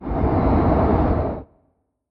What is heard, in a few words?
airy whoosh passing